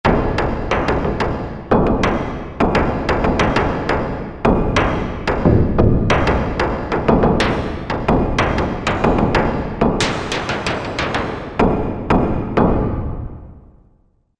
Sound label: Break down, Korg MSC-12S Synth; Synth 3